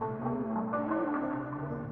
ethno, 125, A

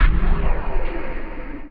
SPACE FX 140; SpaceSounds

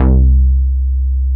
BASS MONO

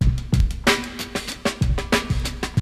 FUNKY DRMR 93, loop; 0- 99 BPM

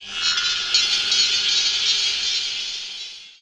TECHNO FX 140

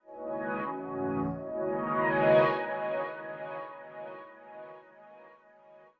SYNTH PAD ETHNO 160 C, Sphere

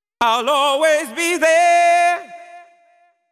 deep house male, 125, A, vocals

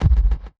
Kick; BlissedOutBeats; Touch Loops; Drums

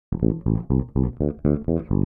DEEPHOUSE BASS 125 A

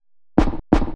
HOUSE EXTRA 125

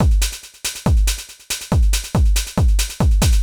TECHNO BEATS 140

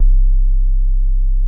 ZUMMBASS C1, SYNTHBASS

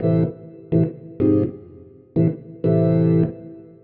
DEEPHOUSE ORGAN 125 A

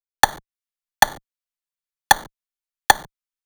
drum loop 128 Perc; Anthemic

